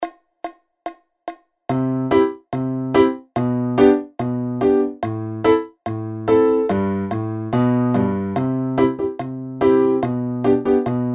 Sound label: Reggae